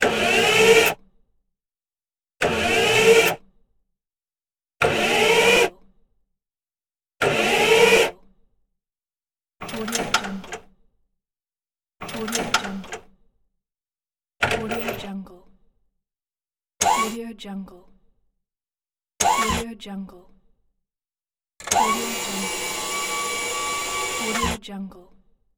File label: robot mechanism; robot mechanism pack 1; xylote